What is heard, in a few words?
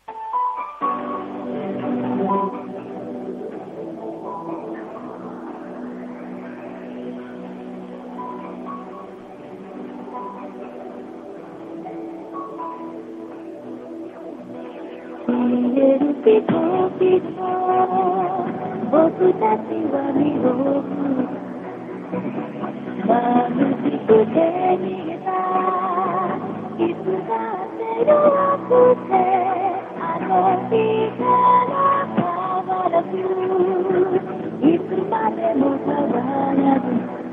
music; キャリア別通話音質